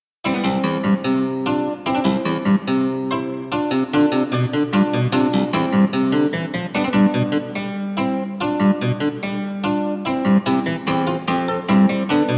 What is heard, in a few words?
Harpsi Lute; dexibell